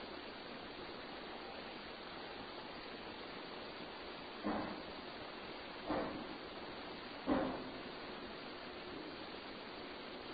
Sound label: 3 bangs